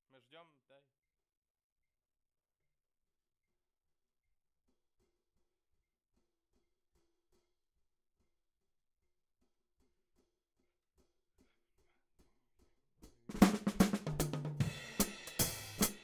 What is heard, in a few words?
Hat